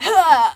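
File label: bail vox female; skater; Tony Hawk's Project 8; XBOX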